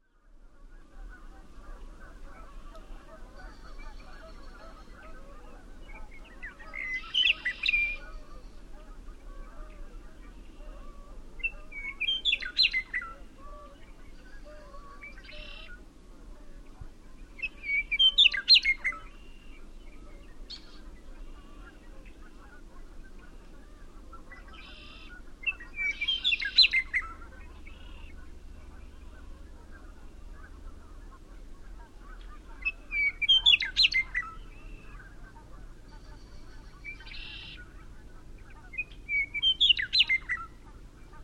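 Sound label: Western Meadowlark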